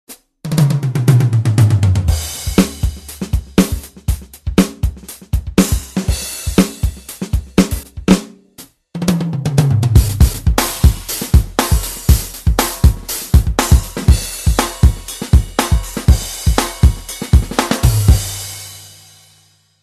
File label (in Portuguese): baterista metal